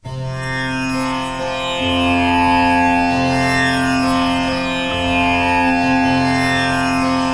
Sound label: Tamboura Pa, far east drones and plucks